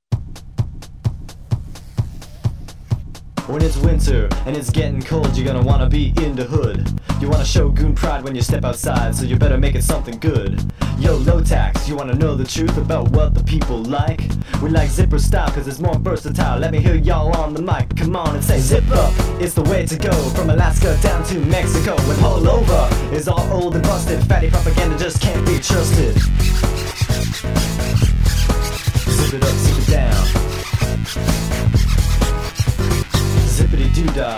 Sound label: Rap